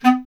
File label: Solo Instruments, B.Clari; Miroslav Vitous - Solo Instruments VOL-1